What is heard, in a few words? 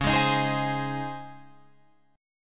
Db Major 7th Suspended 2nd Db Guitar Open F; 13,15,15,15,15,15; DbM7sus2 strummed; JGuitar